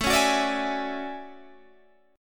D-Major Sharp 11th, A

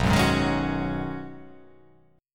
B 6th Add 9th B down, chord

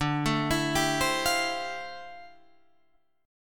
D Minor 11th D down Guitar Open D

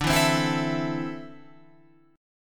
D 7th Suspended 2nd down Guitar Open F; D7sus2 strummed

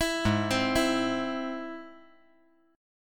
Ab Augmented Ab

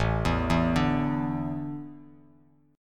A Suspended 4th, Bass Standard; Asus4 arpeggiated; JGuitar

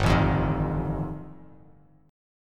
G 7th Suspended 2nd Sharp 5th G, Bass Standard; strummed; JGuitar

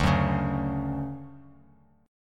D-Major 7th D down; strummed; JGuitar

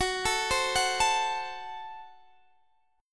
Gb Diminished Guitar Standard; arpeggiated; JGuitar

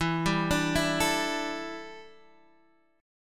D Suspended 2nd Suspended 4th E

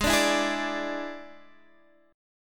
D Suspended 2nd Flat 5th G sharp

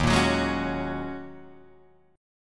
Eb Minor 11th Eb down Guitar Drop D; Ebm11 strummed; JGuitar

